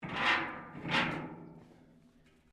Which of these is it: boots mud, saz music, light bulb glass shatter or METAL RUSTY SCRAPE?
METAL RUSTY SCRAPE